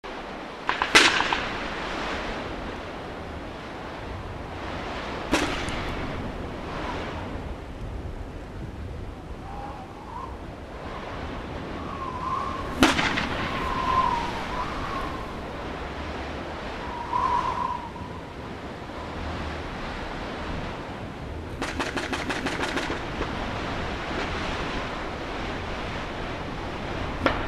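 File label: battle, ambience stereo; mohaa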